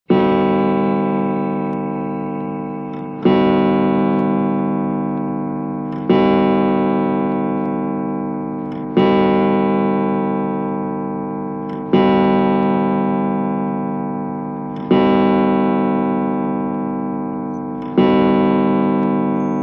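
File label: Grandmother Clock Strike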